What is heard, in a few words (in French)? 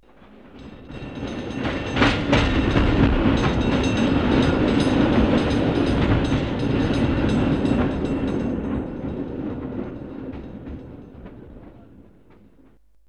Passage de tramway